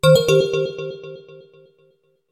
loss; tntbomb

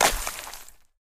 ice break; jewels

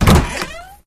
chest open louder; jewels

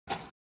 panel hit; gardenbloom

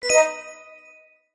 xylophone positive; jewels

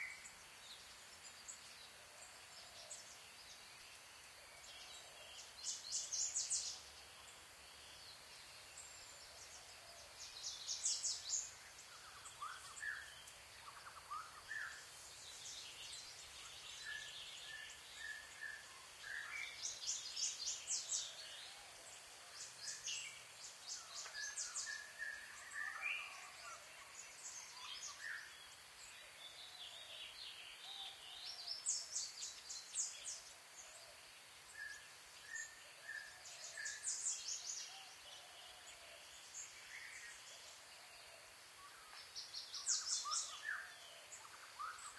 ambient; jewels

